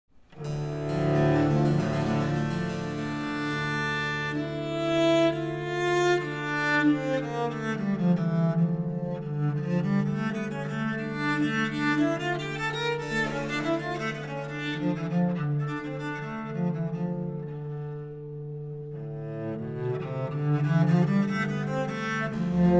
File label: Sonata in D Minor For Viola da Gamba Allegro